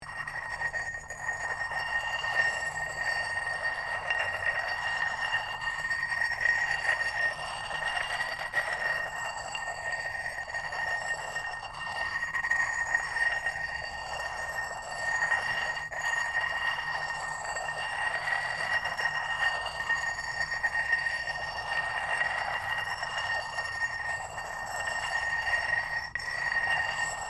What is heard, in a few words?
long flow touch Bb1